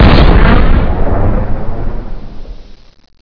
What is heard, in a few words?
LARGE GUN